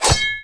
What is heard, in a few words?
hit; angband